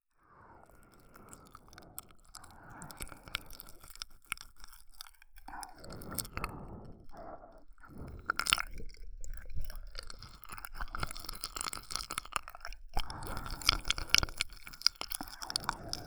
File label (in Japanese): 耳舐め囁き; 天国と地獄の無限カウントダウン; ∞ループ; 腹黒メイドの∞カウントダウン～お坊ちゃま傀儡化計画～